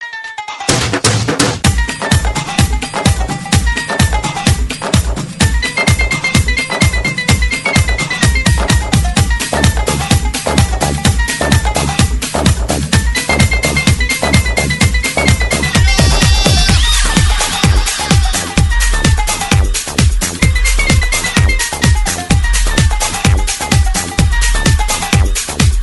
ding ding bhangra mix

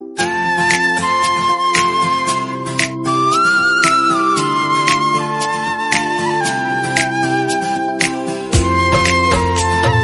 beautiful romantic

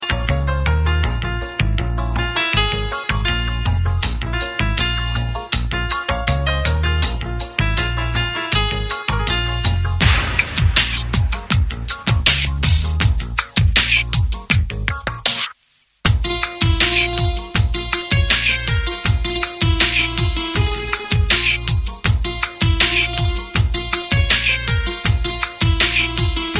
instrumental; Varsham; Indian

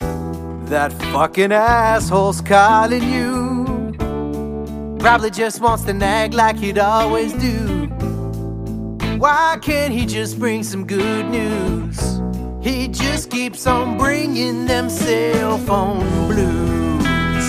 blues ringtone; Ahole Nagging, Cell Phone Blues Ringtone

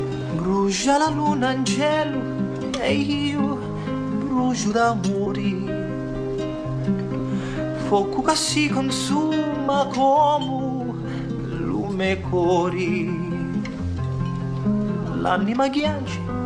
sicilian song; Godfather

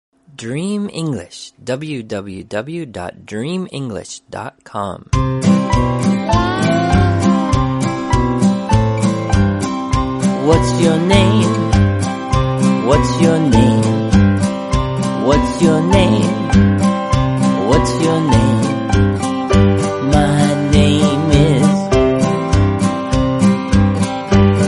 ringtone very famous; Religious

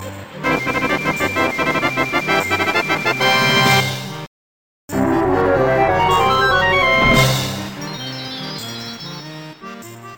new level; Banjo-Kazooie

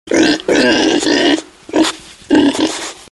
pig sound; Animals

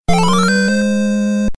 item pickup; Legend Of Zelda

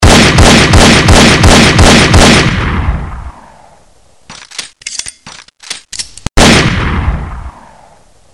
gunshots

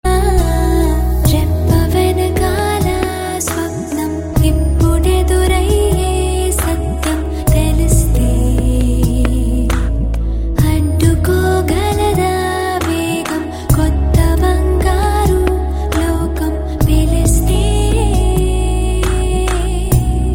telugu soft ringtone

